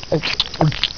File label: gurp; kenny